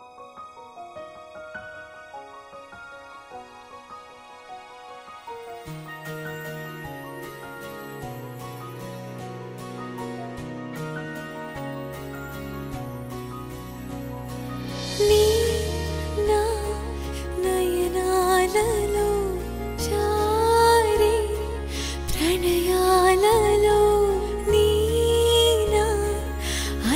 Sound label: Telugu Love Feeling Ringtones